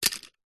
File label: leisure toy car single car drop onto others on carpet; hotwheels